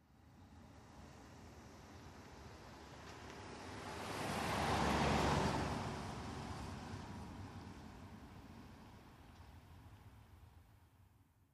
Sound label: Car By Medium Speed